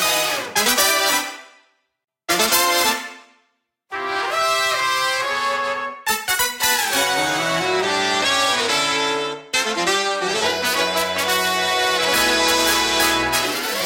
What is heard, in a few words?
cash stack brass; vegas night life